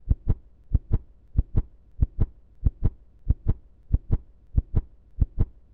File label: Heart